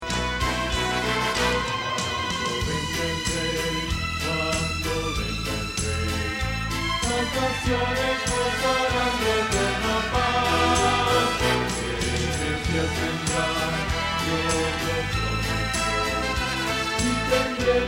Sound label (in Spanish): Bajo